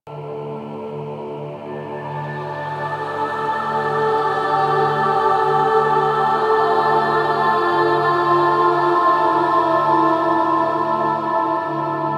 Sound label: music loop; Diorama; Warframe; Quests; Apostasy